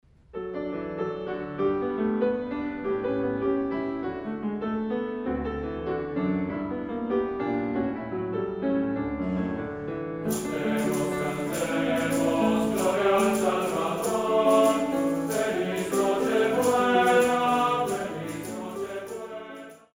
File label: Cantemos Cantemos, Boys' Choir; Christmas Songs; Cantemos, cantemos (VEN); Sofia Boys' Choir